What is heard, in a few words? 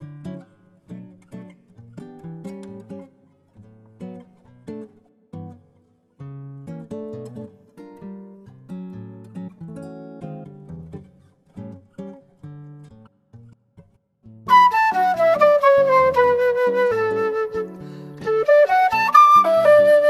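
Cheerful Morning jazz, Sounds Commute to work; Veselaya Utrennij dzhaz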